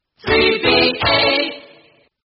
jingle; australian radio 3ba

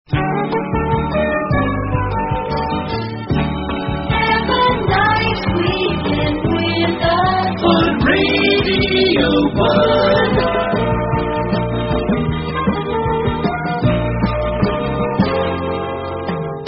radio 1 jingles have a nice weekend with us

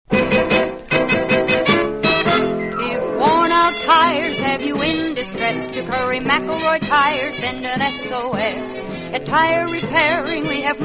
radio jingles; curry mcelroy tires; allied radio artists

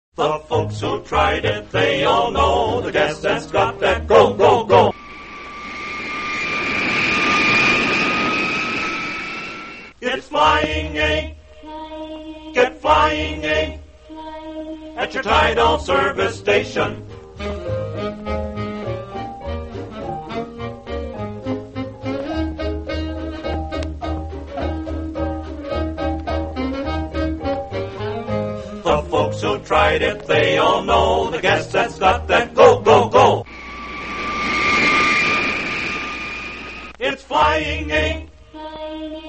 radio jingles; flying 8 gas; allied radio artists

